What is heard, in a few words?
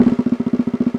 Korg N5 Rock Kit C#3